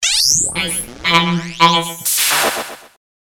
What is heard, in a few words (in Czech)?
robot